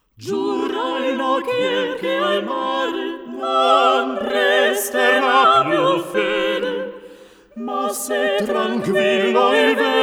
Mehrstimmige italienische Gesange; ''Fra tutte le pene I''; Der Glorreiche Augenblick Op.136; WoO99

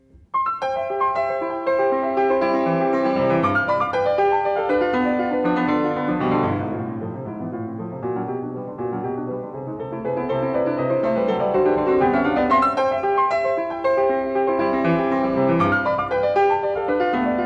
Waltz in C major, Presto; Ludwig van Beethoven - Complete Works [Brilliant Classics 100 CD Box]; Piano Variations IV; 33 Variations on a Waltz by Diabelli in C major Op. 120

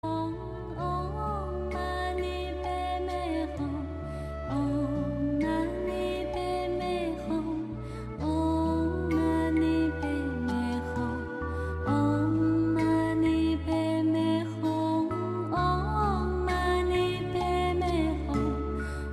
om mani padme hum, 432hz

